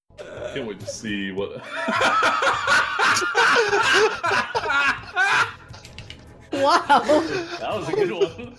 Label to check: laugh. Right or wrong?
Right